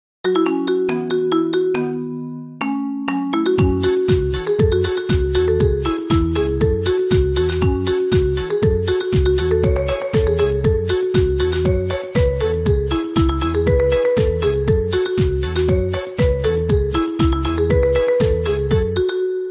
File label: marimba